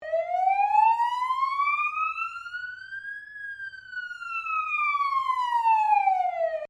SIREN POLICE AUSTRALIAN SHORT LOOP EXTERNAL STEREO, Australian emergency service sirens